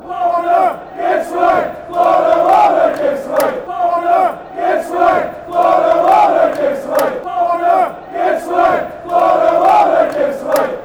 give us wave, fan chants

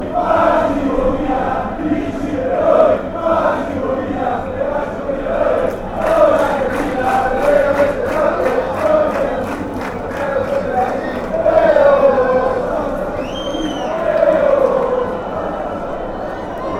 chants; magic milan

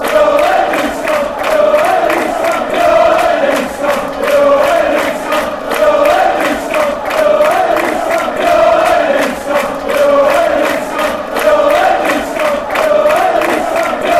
we all hate leeds scum, fan chants; mkdons

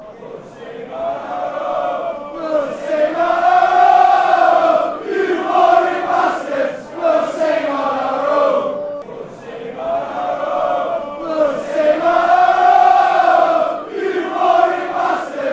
well sing our own fanchants; mtfc

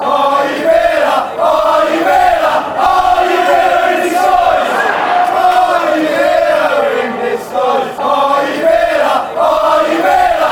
are you villa in disguise fanchants

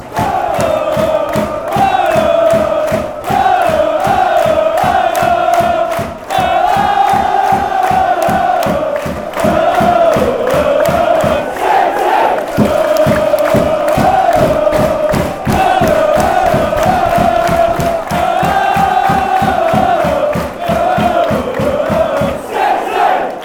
woah city, fan chants; york

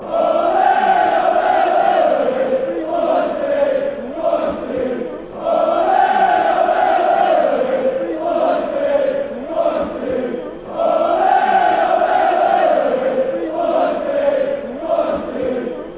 ole, chants; boycey

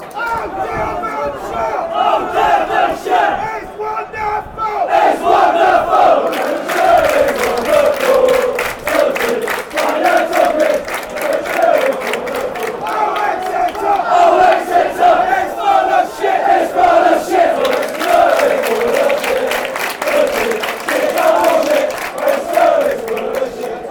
oh devonshire fanchants

